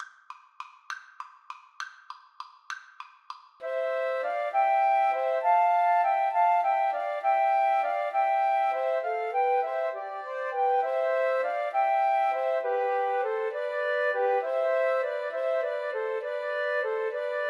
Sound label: Flute Trio